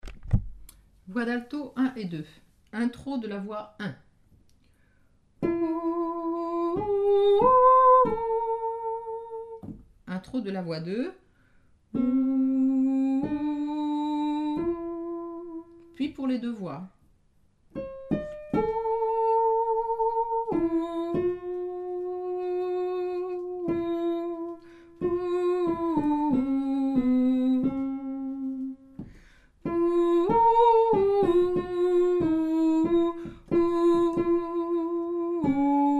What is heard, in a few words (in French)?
villancico alto 1 et 2